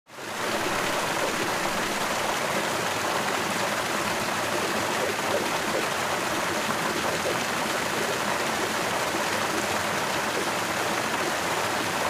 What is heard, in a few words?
water fountain